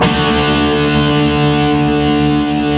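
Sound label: guitar power chord, distorted; Power Chords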